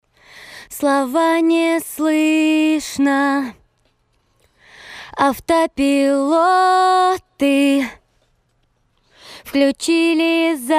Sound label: Acapella